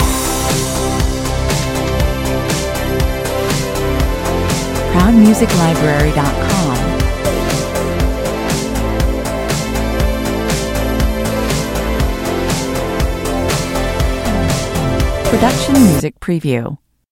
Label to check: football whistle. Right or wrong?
Wrong